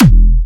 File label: Springer E4, Big Room Kicks